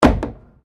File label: PLASTIC BARRICADE CABLE HIT